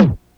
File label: Tom Bend; Lost Tapes From Mars